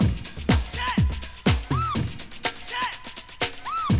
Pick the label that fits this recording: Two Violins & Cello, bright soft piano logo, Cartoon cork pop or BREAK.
BREAK